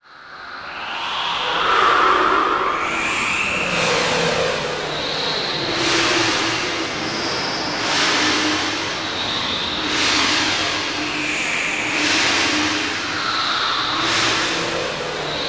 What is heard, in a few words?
synth fx; Zero G; Malice In Wonderland